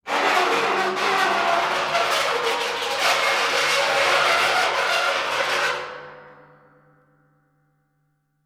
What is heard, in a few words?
Corrugated Iron Sheet; 99Sounds; The Warehouse